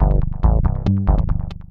SNTH BASS ELCTR 140 A, Techno

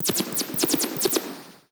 SPACE FX 140; SpaceSounds

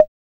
CR-78

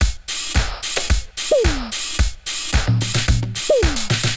110 Disco Tapes, Electric Drums; Loops; Touch Loops; CosmicFunk

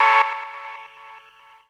KEY SHOT TEKNO 140 A; Techno2; Singleshots

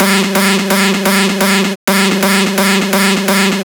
synth 128 G; Electro; Anthemic; synth loops

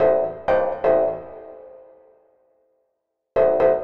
DEEPHOUSE ORGAN 125 A

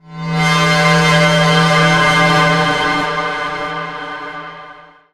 TECHNO FX 140

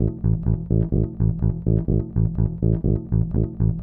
DEEPHOUSE BASS 125 A

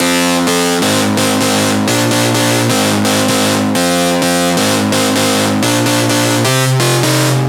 synth 128 Cm; Anthemic; synth loops

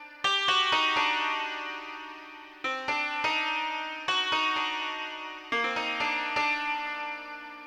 ethno, 125, A

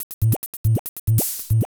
TECHNO BEATS 140

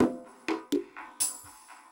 PERCUSSN ETHNO 125, Drum

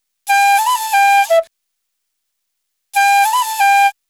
ETHNO EXTRA 90; ethno2

